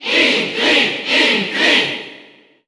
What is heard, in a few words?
Cheer Italian; Inkling; SSBU